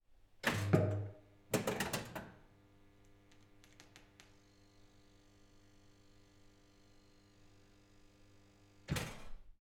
Turning On Arcade Pinball Machine

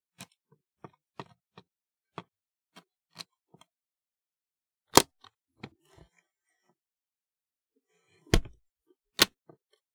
Opening Suitcase Clasps

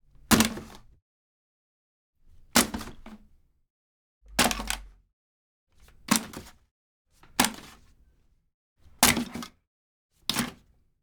Framed Picture Wood Plastic Toss In Garbage

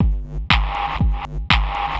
Drumloop 120 Bpm Edm Drum Loop

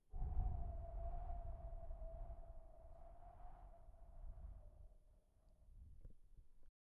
Howling Wind

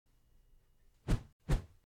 Hitting With Rod

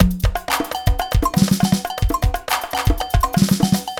Samba

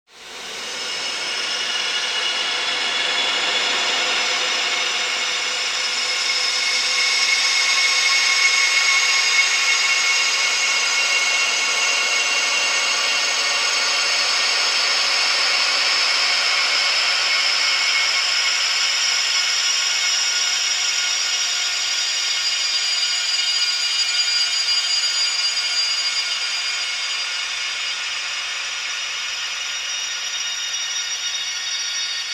Stretched Smash

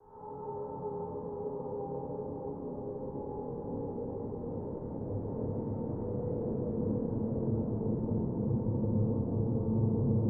Space Wind

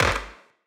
Gun

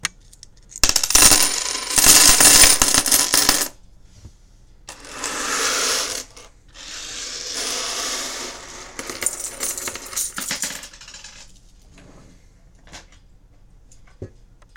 Coins Dropped Slid

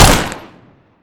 Usp Pistol

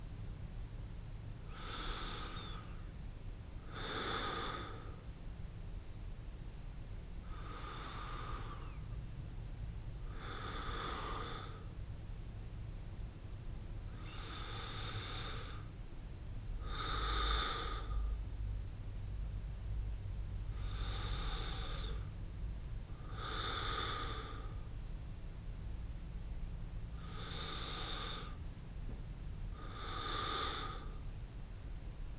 Heavy Breathing

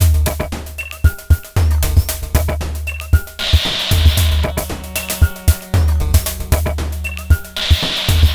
115 Bpm Stab Loop

